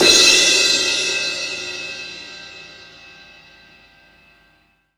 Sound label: CYMBAL, CRASH; Sonic Foundry (Sony Creative Software); Drum Tools; Laidback